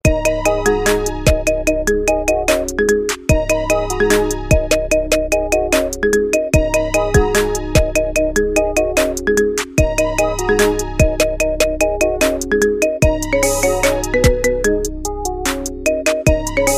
marimba remix

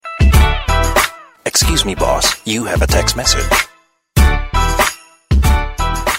excuse me boss rap

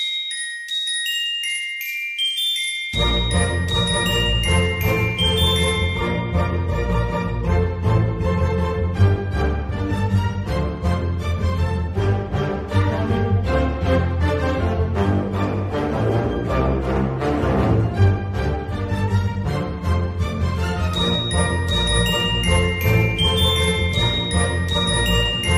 symphony tune

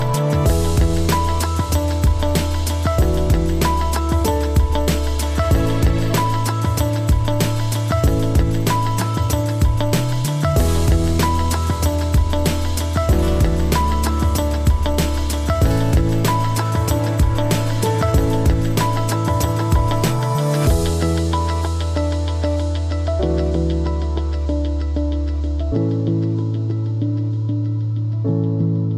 meditation

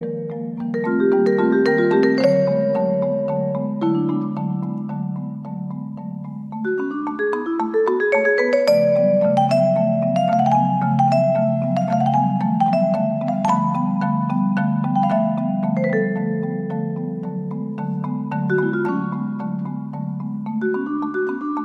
quiet songs

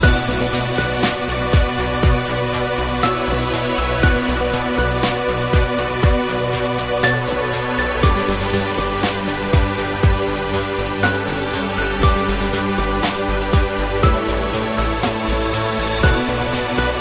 trance

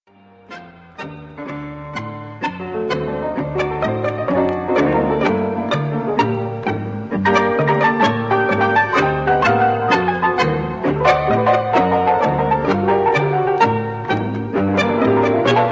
tango

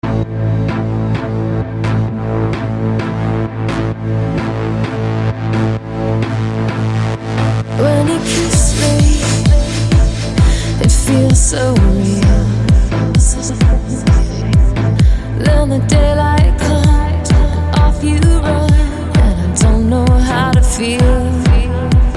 trance uplift

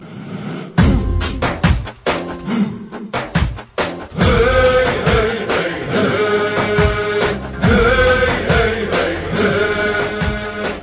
arabic sms

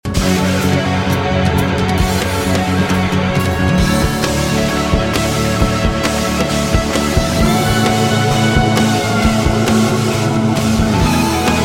rockstar guitar

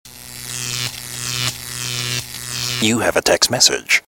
electricity sms

